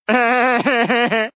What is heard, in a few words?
laugh; spooner street